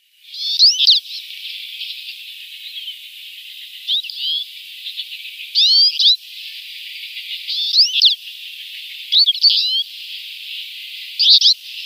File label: Twite rambling song